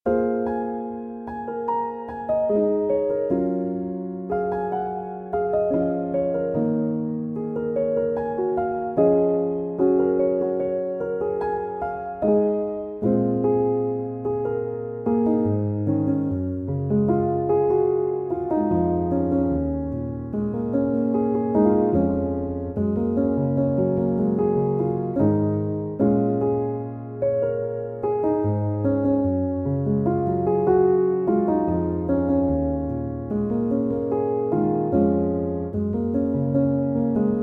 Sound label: piano instrumental